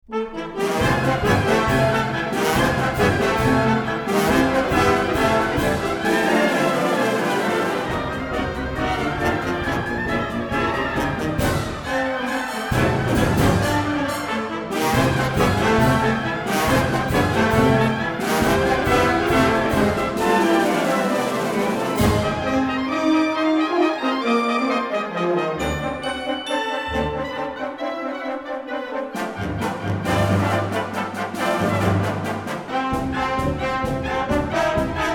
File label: Symphonic Songs For Band Celebration